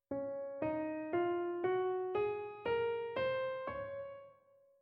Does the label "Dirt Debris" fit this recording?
No